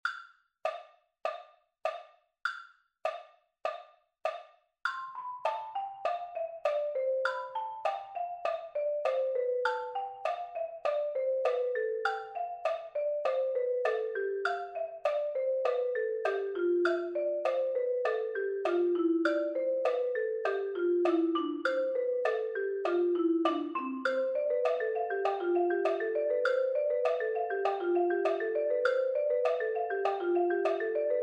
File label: Triple Beat 100 bpm Mallets